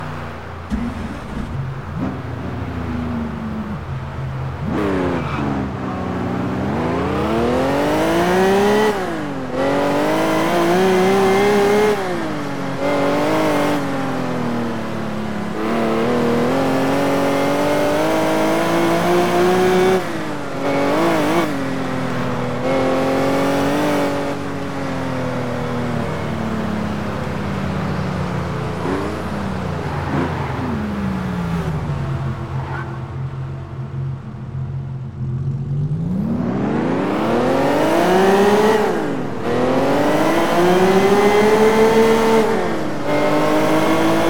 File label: ferrari 288 gto; TDU-1